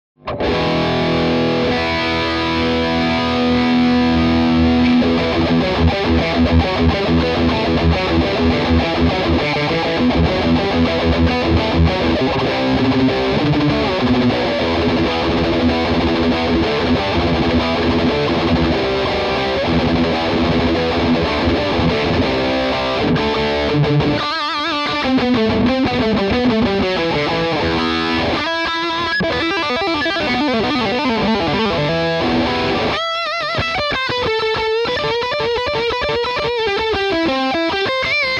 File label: Strat Am Pro Metal Puente